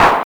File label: CLAP, Drums Dance & Groove; USB Soundscan vol.11; AKAI; TRASHKIT